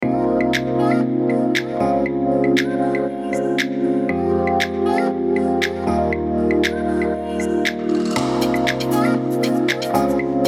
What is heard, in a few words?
pop music